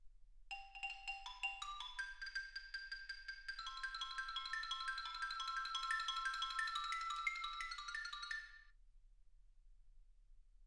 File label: xylophone